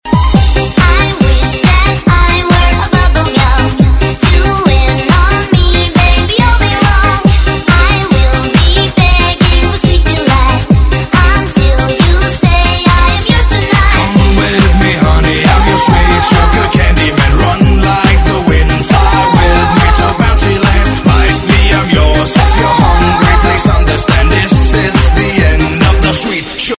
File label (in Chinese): 动感舞曲 劲爆节奏